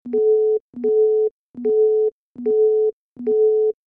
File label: Alarm Synthetic; hi-tech-various